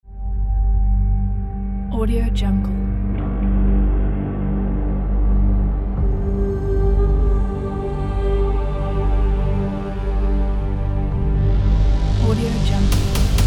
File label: fast epic intense teaser; sunlightdefect